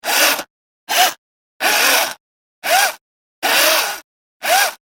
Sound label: industrial tools drill electric various; Electric Drill - 6 Short Bursts Of Power ( Freewheeling ); Drills; McKinney Sound